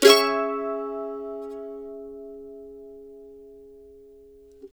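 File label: CEE CHORDS C# minor, Blues Guitar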